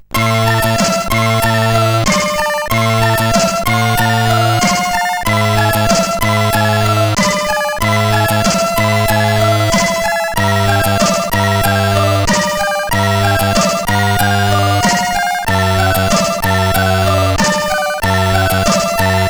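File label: Game Over, MOS 8580; Sniff Sniff; Wijnhoven Joachim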